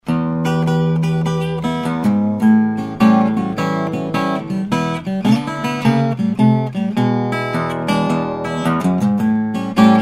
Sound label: gibson l-75, vintage archtop guitar; 1934; mahogany spruce